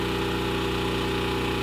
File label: running generator, ambience